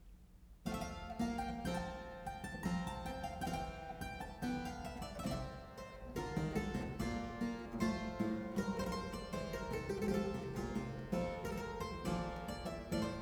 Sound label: Polonaise in F Major; Bach; Anna Magdalena Bach Notebook (highlights); 尼古拉斯 · 麦克吉根